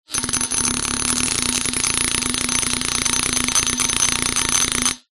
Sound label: foley glass bottle hair trimmer on against vibrate; zapsplat